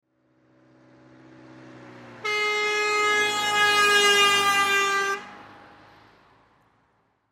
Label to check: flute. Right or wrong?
Wrong